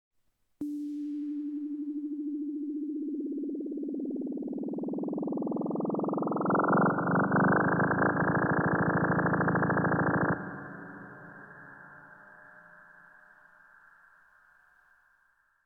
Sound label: 80 Aliens Lead